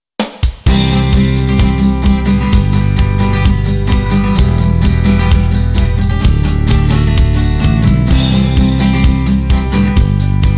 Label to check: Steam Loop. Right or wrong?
Wrong